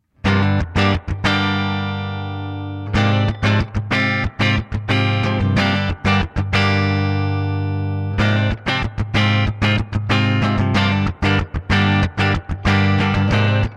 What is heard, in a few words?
clair rythm West; rythmique son clair